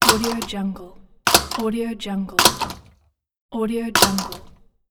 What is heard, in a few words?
aluminum door close; lokohighman